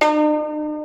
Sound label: plucks